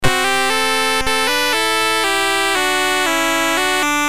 MOS6581R3, GAMES; Who Killed Cock Robi BASIC; HVSC

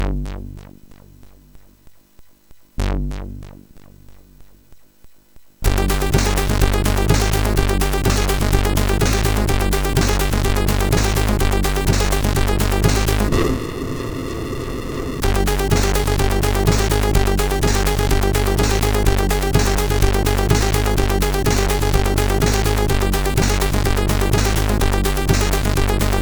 Techno Tune, MOS6581R4

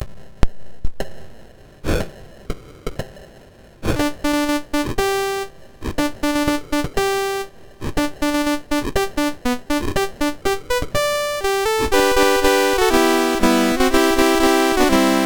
Battle Cry of Freedom, MOS6581R2

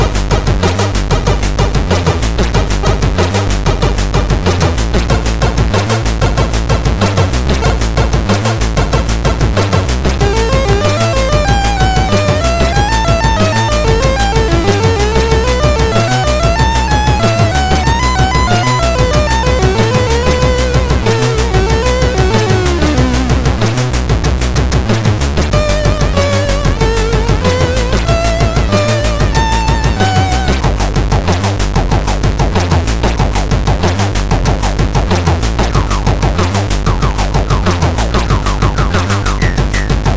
Funk, MOS6581R3; hvsc

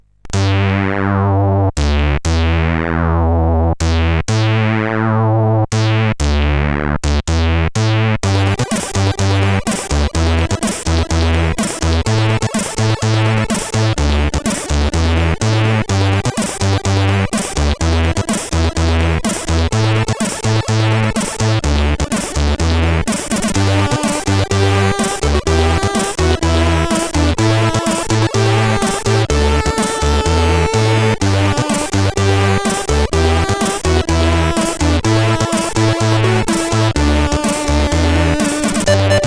Circular Letter tune, CSG8580R5

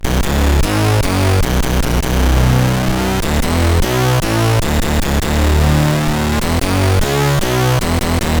Test, CSG8580; hvsc

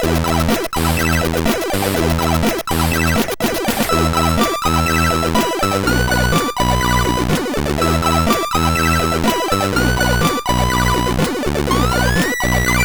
Scroll Mover, CSG8580R5

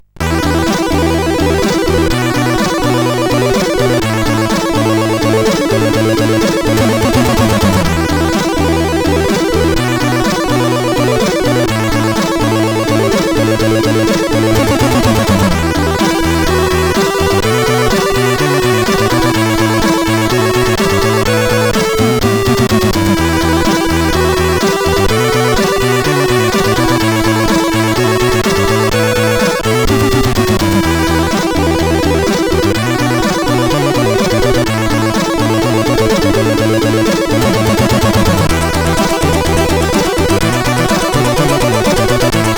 title, sid CSG8580R5; hvsc

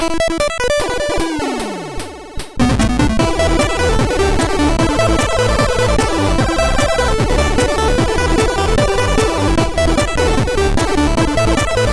Keygen, sid, MOS6581R3; Elimination

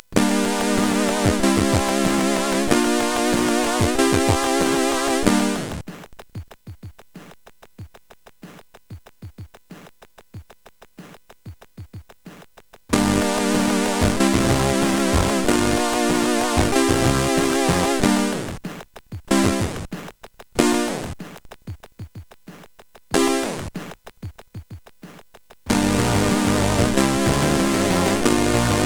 Daring Dots, CSG8580R5; sid

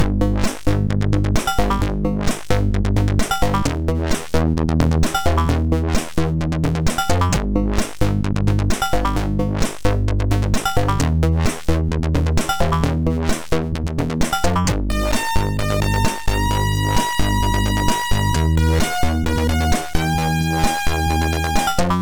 Lil Bass, sid MOS6581R4

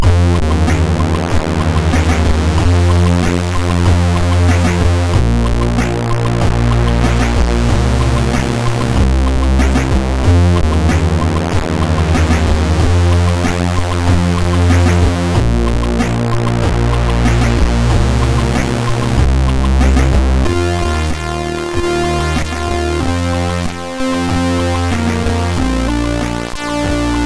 Old Music, MOS6581R2

